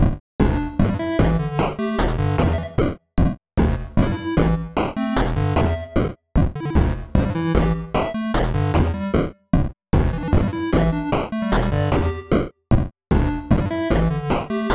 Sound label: beepbox